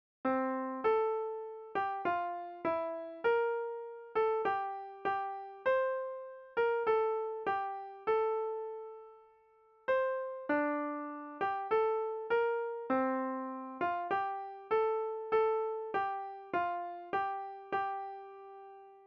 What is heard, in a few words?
hymn melody